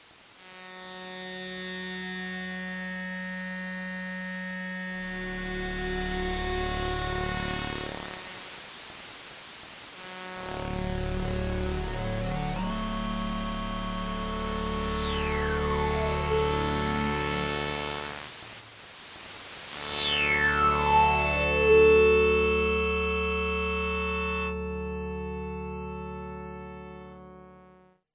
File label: D drone